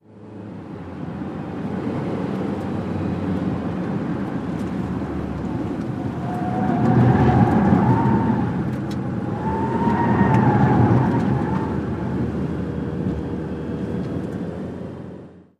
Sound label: Car Cornering, compact